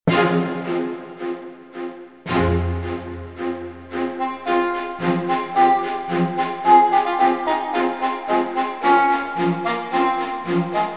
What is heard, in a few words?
e horn